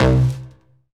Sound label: BS BS, Synth Bs FX